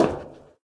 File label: bleacher step; Golden Crayon Sounds